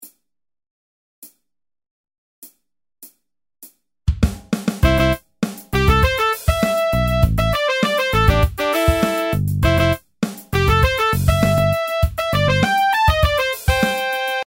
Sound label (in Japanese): ブラス